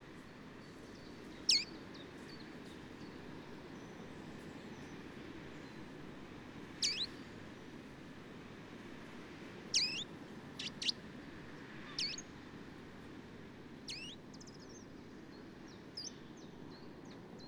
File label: calls